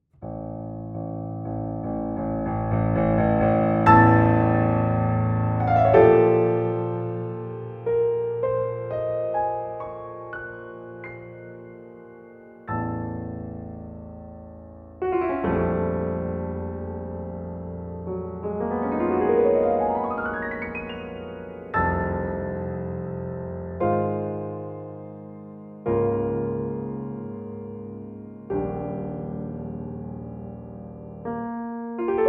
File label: Piano Solo